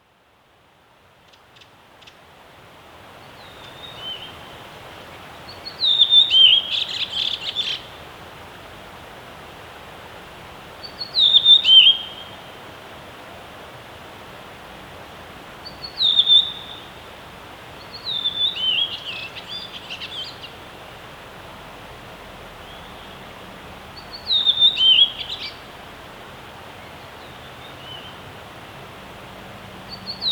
rödvingetrast; fågelljud

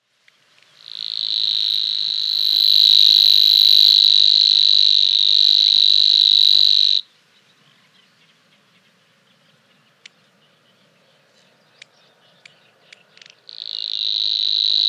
vassangare; fagelljud

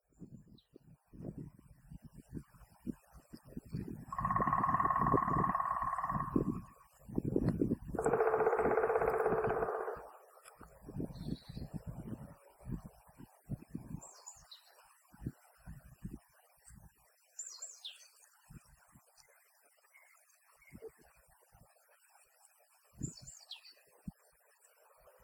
spillkråka; fågelljud

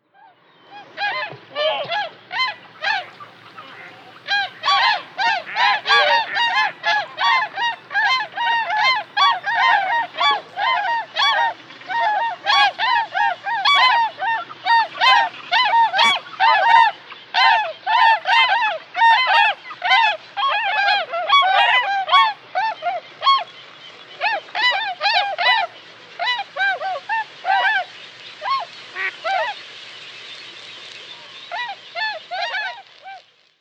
mindre sångsvan; fagelljud

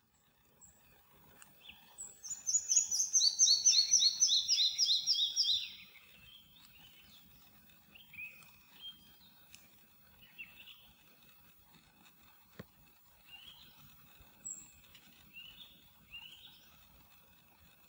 mindre flug; fågelljud

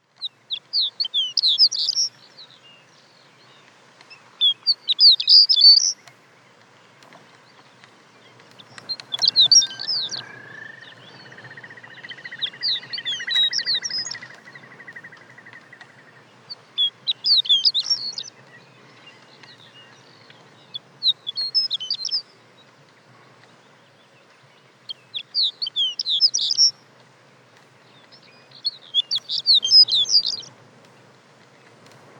berglarka, fagelljud

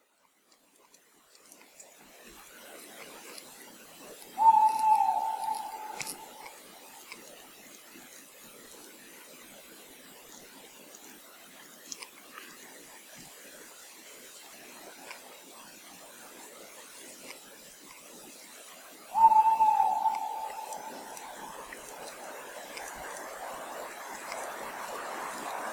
kattuggla; fågelljud

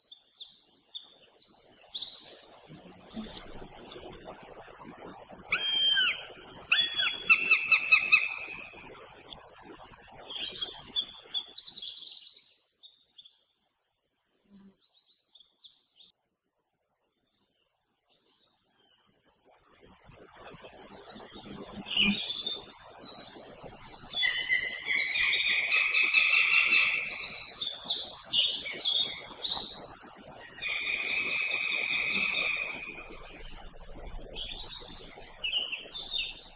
duvhök; Änga 8 juli 2014; fågelljud

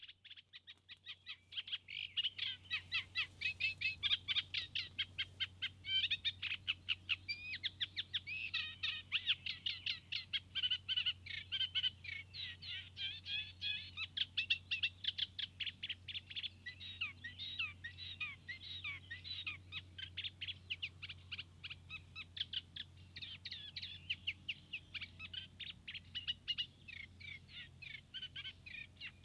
rorsangare, fagelljud

